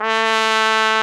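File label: BRS CORNET, Orchestral Ensemble; Roland L-CDX-03 Disk 2; Orch.Ensembl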